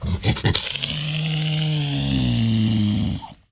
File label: Mule Deer Grunt, Snarl. Single Grunt, Snarl With Breath